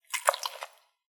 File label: Wet Rubber Slight; Sex Sounds; ero sfx